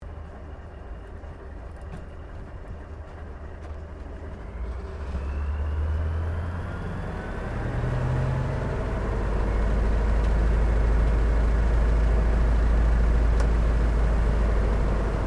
Aircraft CAC CA-25 Winjeel shutdown interior, WW2 era Australian training aircraft; Airplanes